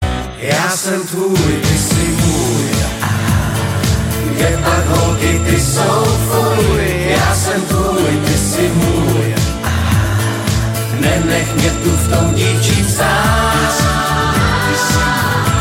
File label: Girls Are Ugh